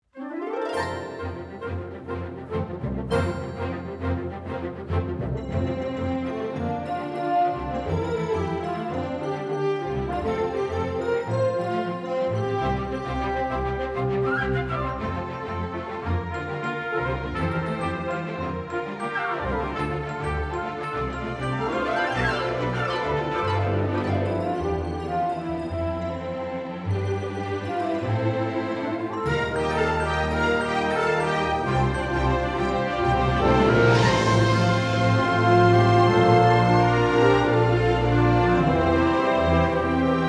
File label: Parade Loop